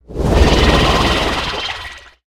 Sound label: Underwater Scooter Underlay Attack